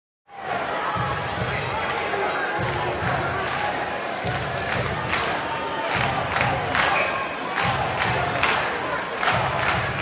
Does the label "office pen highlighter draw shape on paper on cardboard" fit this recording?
No